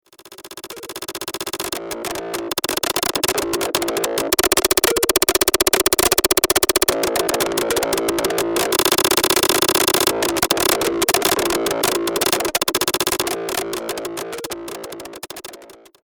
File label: audio conferencing